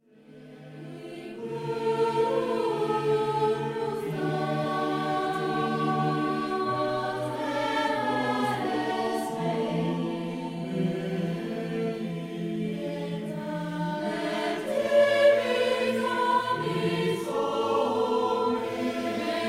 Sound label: oboe